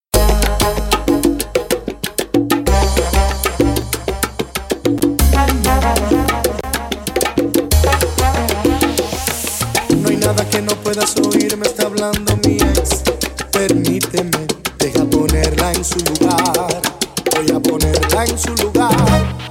Salsa Romanrica Mix

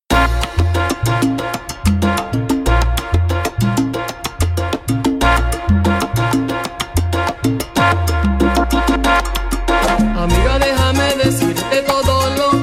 Salsa Mix

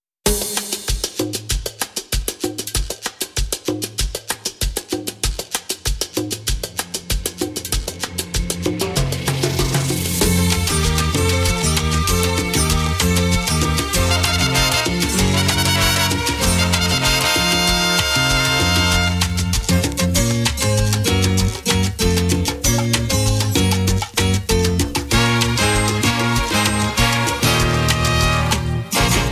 Salsa Mix

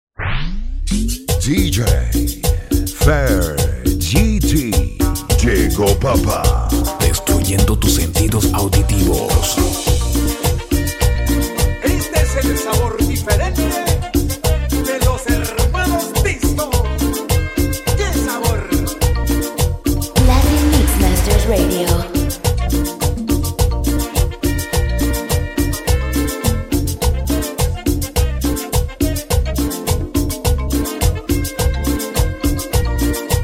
MARIMBA MIX

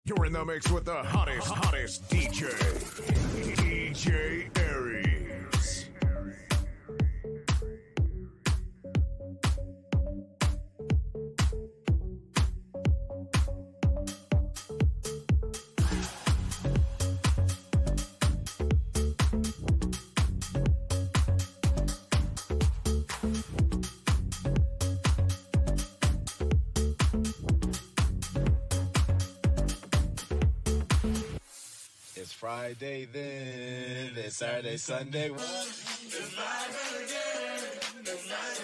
Electro House Mix

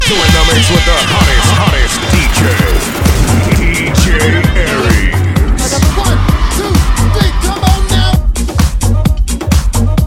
Latin Dance Retro Hits

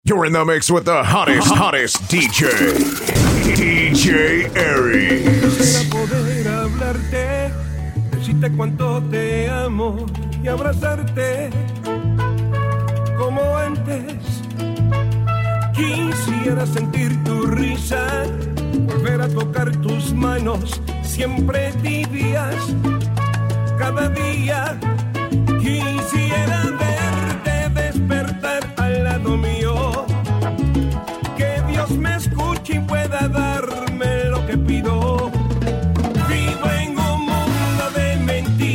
Salsa Classic Mix

